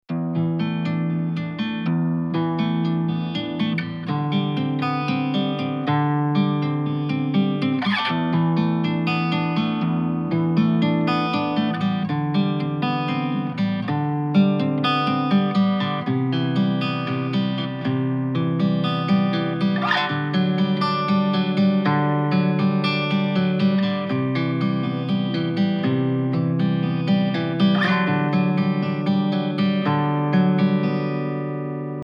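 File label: Em 120 guitar arpeggios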